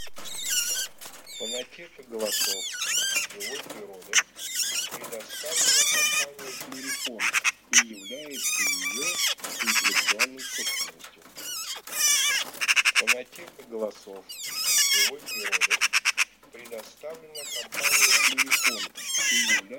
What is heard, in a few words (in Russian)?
Сорока Gazza pica pica